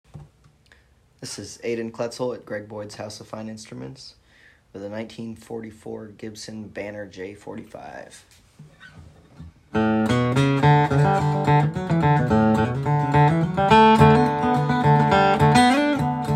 Gibson j45; 1944; banner

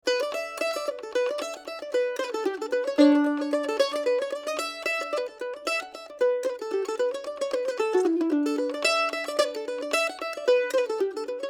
Gibson F5

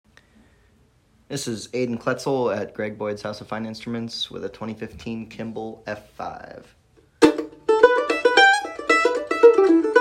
Kimble F5

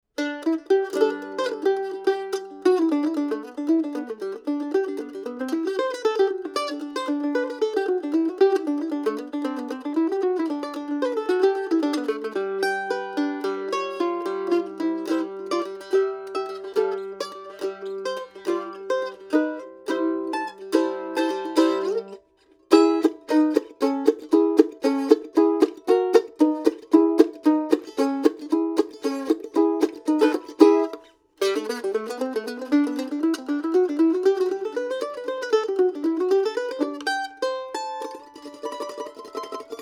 A Mandolin; Early 70s; Givens

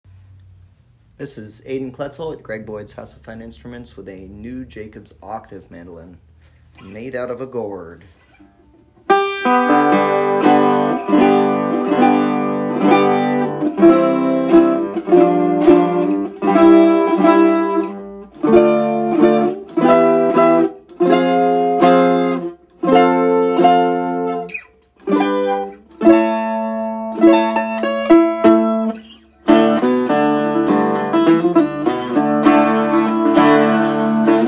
octave mando

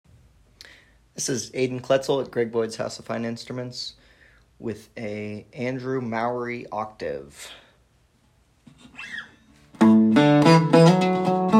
octave guitar body; Andrew mowry

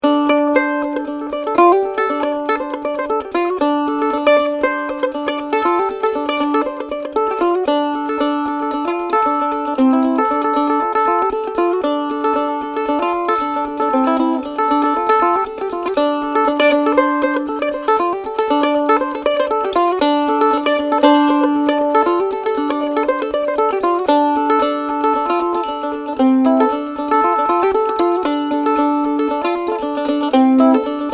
Blues hw mandola

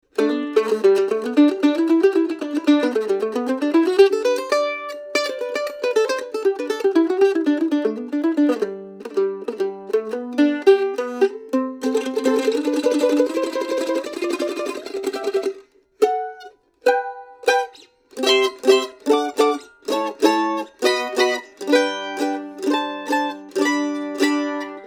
F 5 Mandolin; 2004; Blohm

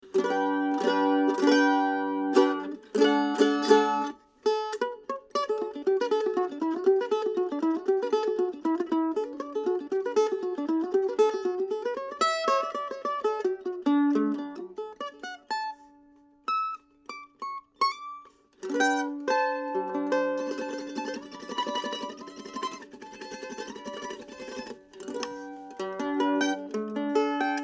Blues Hardware A Mando

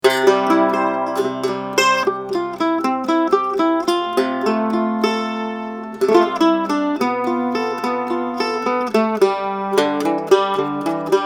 blues mandola

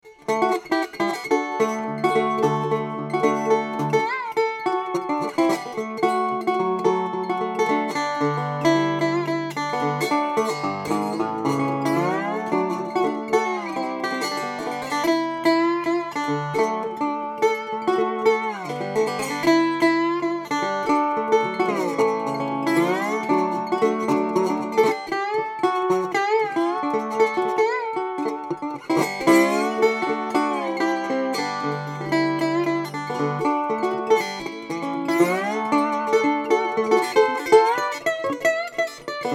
custom tricone; Rayco; Tricone 250

